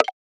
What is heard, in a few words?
perc; eddyflux